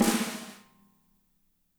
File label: MED SNR, SLAM KIT; Spectrasonics - Burning Grooves CD2